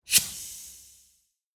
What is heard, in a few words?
Compressed Air Release; noise creations